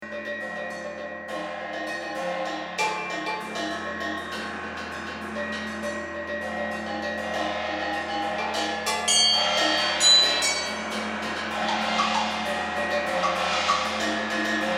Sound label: bells, marimba